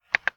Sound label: Click